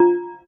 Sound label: clunk, Synths; Notes